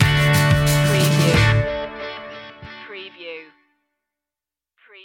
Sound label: indie feelgood stinger